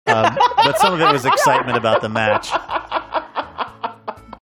awesome laugh

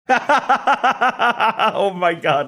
laughing saying oh my god

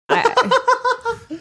cute laugh

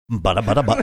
singing truncated funky bassline

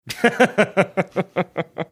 chuckling

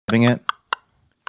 tongue clicking

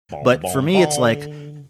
singing few notes of theme talking

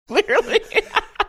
laughing saying clearly

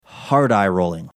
hard eye rolling

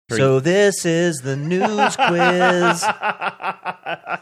singing so this is the news quiz

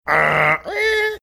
making funny sounds

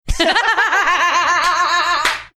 laughing

